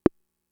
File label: Conga, Fricke MFB-522